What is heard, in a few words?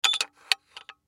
Door Lock Slide, Door Lock Slide Lock; Blastwave